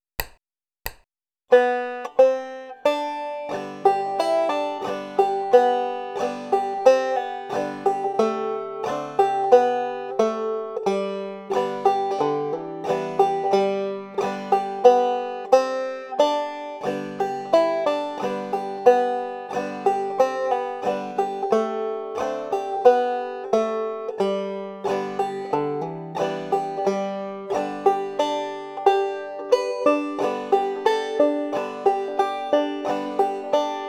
wildwood flower mit hammer on und pull off

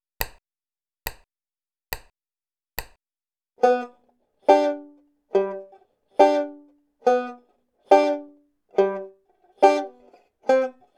vamping und akkordwechsel

